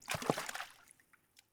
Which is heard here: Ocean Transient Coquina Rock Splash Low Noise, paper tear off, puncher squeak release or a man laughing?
Ocean Transient Coquina Rock Splash Low Noise